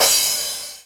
Cymbals crash; Vengeance Samples

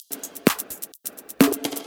Electro Loop 128 BPM; Vengeance Samples

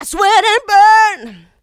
voc, female vox, Vocal House

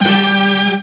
Synths G; vengeance essential club sounds-3

